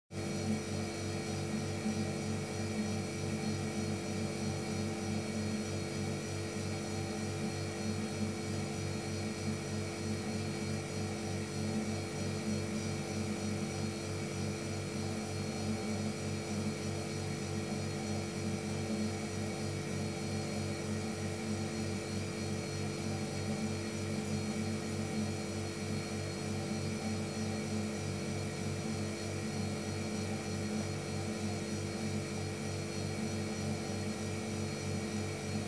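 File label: fridge humming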